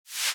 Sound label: MMC removal, Nokia E52